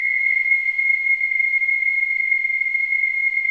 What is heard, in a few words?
WHISTLING; Spectrasonics; Symphony of Voices